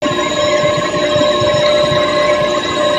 Racer Computer Idle; gnarled forest

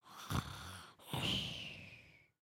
Sleep, creatures organic; Lego; Strombie

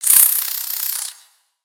Spider Dazed; Lego; creatures mechanical

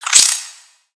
Spider Attack Melee; Lego; creatures mechanical

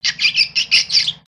Pet Bunny Ecstatic; creatures pets; Lego

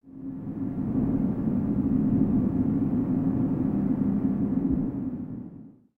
Wind Cave One Shot; ambience; Lego

